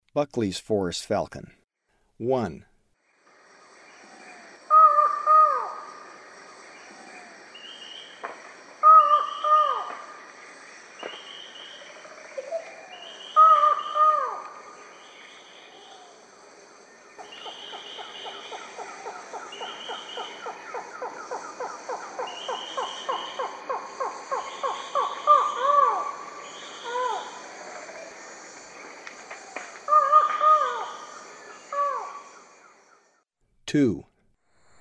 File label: Buckley's Forest Falcon, Birds of Ecuador; S. America